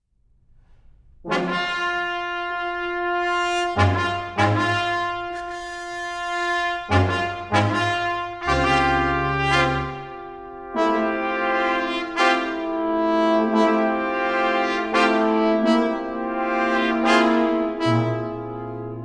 Brass Quintet

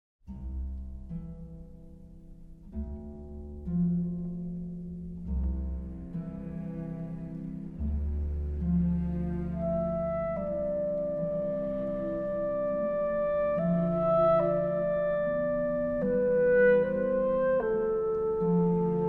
Concerto For Clarinet, American Jazz; American Jazz Concertos

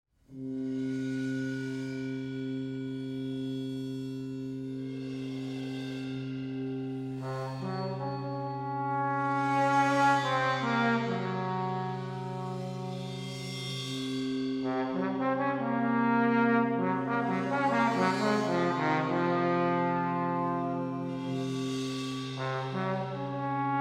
Prism, Shards Of Color for Brass and Percussion; Mvt. 2. Indigo

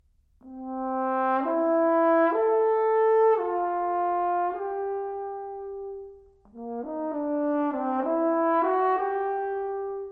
Sonata For Horn & Piano, Moderato ma non troppo

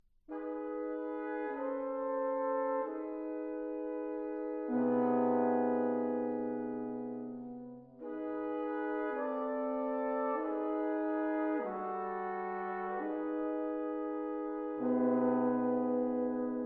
Brass Quintet, Solemn

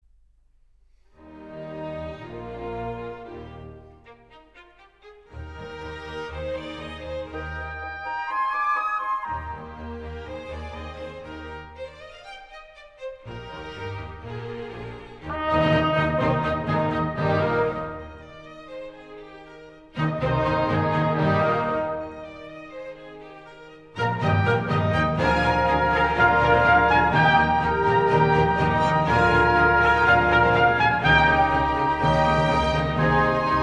Concerto in E Flat Major, Allegro

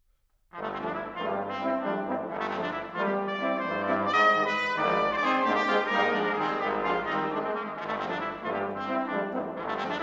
Quintet Mambo, Brass

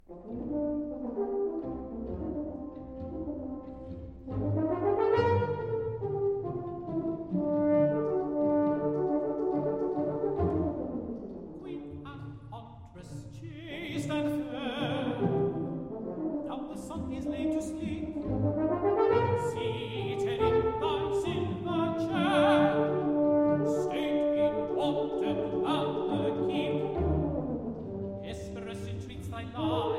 Serenade for Horn, Tenor & Strings - Hymn

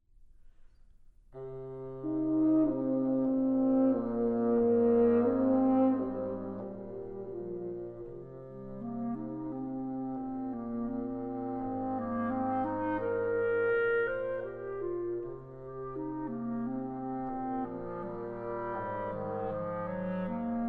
Quintet for Winds

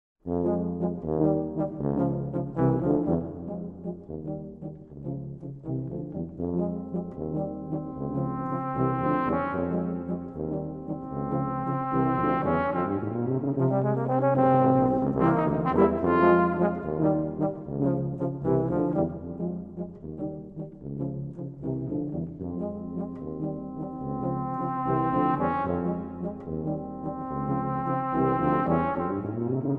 Jazz Waltz; Manhattan Suite - III.

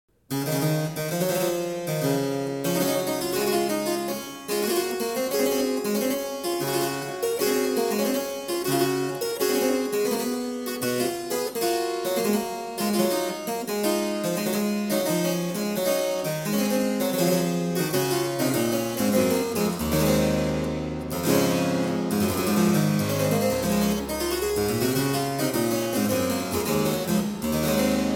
Suite I in D minor Chaconne, Harpsichord; Music for Harpsichord

